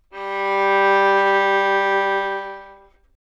開放弦でのヴィブラート, 擦弦; Open-G; 弦鳴楽器

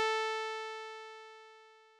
調波構造音（10～30本） 高域, 仮想ピッチ; 音の高さ; 高域下がり・時間減衰波

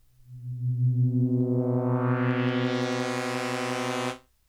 ミニ・モーグ, 電鳴楽器; 音色の時間変化

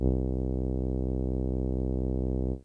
ミニ・モーグ, 電鳴楽器

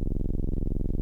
ミニ・モーグ, 電鳴楽器; 最低音４音色

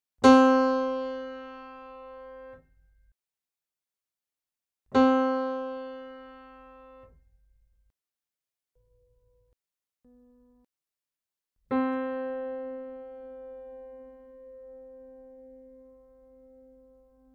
Pf, 打弦; C4; 弦鳴楽器

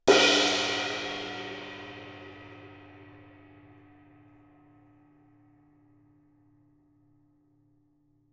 シンバル; 体鳴楽器; 打奏

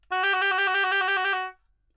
オーボエ, Double Reed; 気鳴楽器

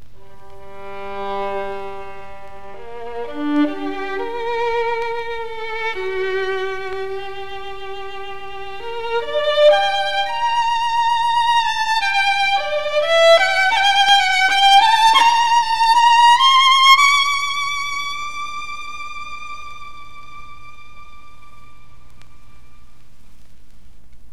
Guarneri, 擦弦; 弦鳴楽器

